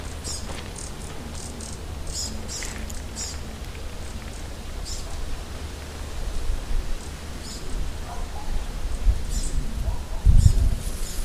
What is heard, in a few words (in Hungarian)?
kek cize hangja